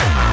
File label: obnoxious kick